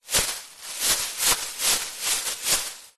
plastic bag thin empty shaking open up; foley

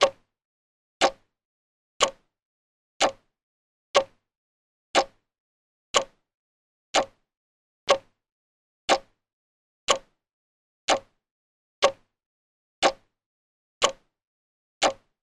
clock tick tock loop

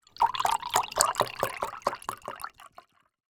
orange juice pour into glass; food drink; zapsplat

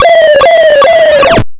multimedia retro game lose life

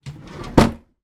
empty chest of drawers drawer open; household; zapsplat

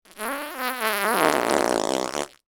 human blow raspberry

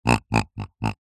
pig grunt designed; animals

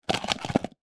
ice chunk movement in plastic bowl; sound effects one

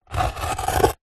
office pen biro draw single line on thin wood

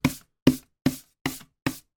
household brushing hollow wood x5; zapsplat

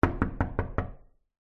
household window person knocking on window

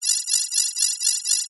science fiction computer scanning tone

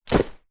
foley luggage rucksack backpack drop concrete

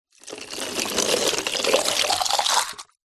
milk pour into empty plastic bottle; food drink; zapsplat

